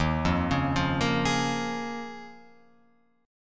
D sharp 7th Suspended 2nd Suspended 4th, Guitar, Open F; D#7sus2sus4; arpeggiated